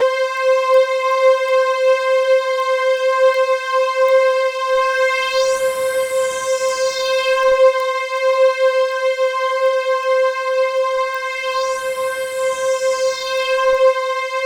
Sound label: C4 FM, Synth; 90 s Sample CDs; NiceBeats; SynthLine VOL-20